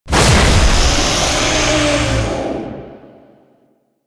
Pickup Tear; Warframe; Entrati; Swarm

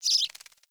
Fey Flock Attack Dive; Powers

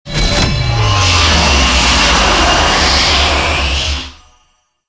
Area Defense Start; Warframe; Nokko Colony

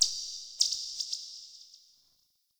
Bird, Ambience, Garden; Eternium Garden

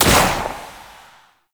Shot, Pistol; Warframe; Weapons; Tenno; Tenno Priest Pistol; Knell Prime

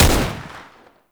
AK47 Fire; Warframe; Tenno; Weapons

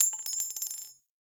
Sniper Shell Casing; DE; Warframe; Frumentarius; Exalted Sniper

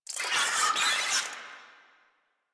Infested Ashen Vocal Attack; Warframe

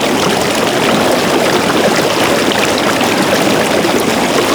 Shipyards Sludge Fall; Warframe; Grineer Shipyards

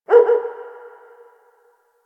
Distant Ambient Dog Bark; Warframe; Ambience; Nineteen Ninety Nine

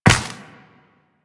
Step Ice, Footsteps Walk; DE; Warframe; Tenno

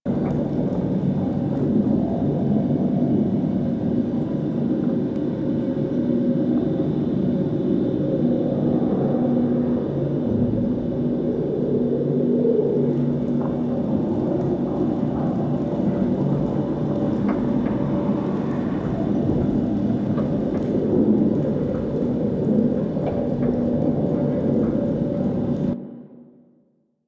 Room Int Amb A; Warframe; Ambience; Grineer; Grn Interior

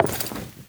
Walk Wood, Footsteps; Enemy; Lady Deora

